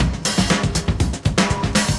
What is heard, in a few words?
breaks, 120bpm; free bespoke breaks; BeatStyleG